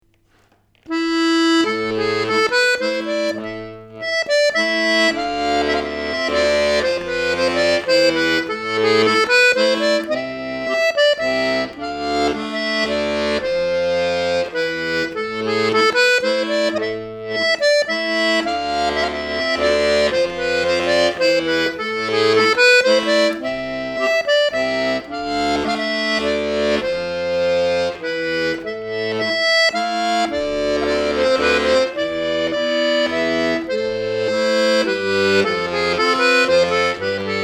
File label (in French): Menuet, accordeon; Menuet de la Chaine